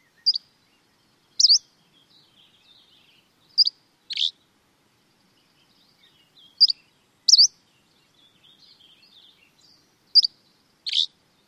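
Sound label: Dusky Flycatcher song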